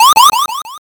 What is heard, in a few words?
warning